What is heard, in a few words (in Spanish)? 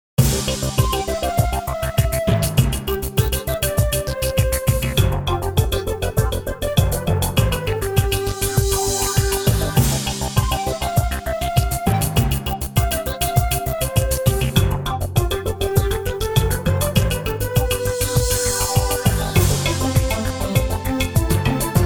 musica